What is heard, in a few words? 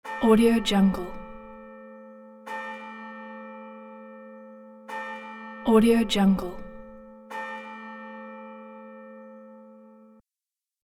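church bell sound all notes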